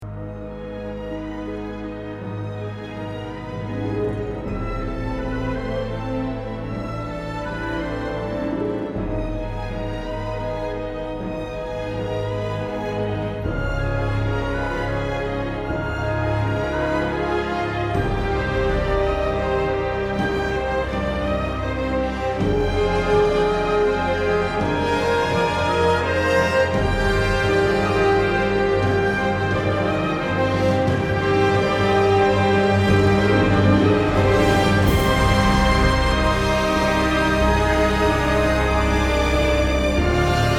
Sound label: Rocket Reveal